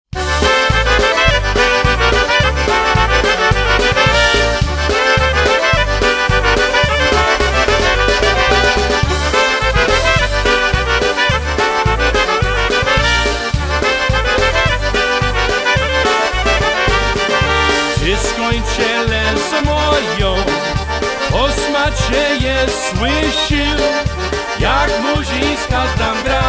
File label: Polka